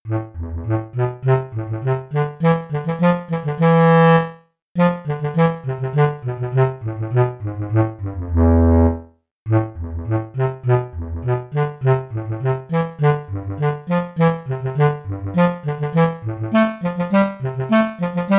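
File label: bass clarinet fast; BASS CLARINET Technical; Region 23 Middle School Etudes for 2026-27 (Year C)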